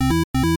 8 Bit Incoming Enemy

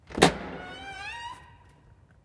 Metal Door Open

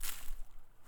Flail

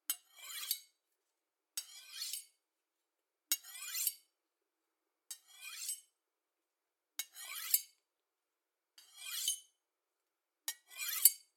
Knife Being Sharpened

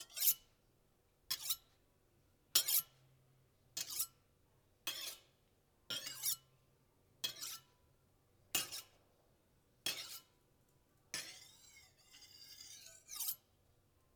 Knife Swords

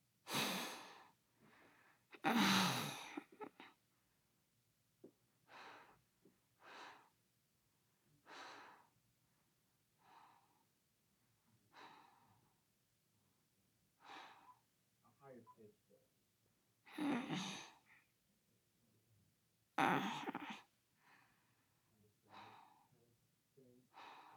Young Boy Gasps Grunts Small Inhales Exhales

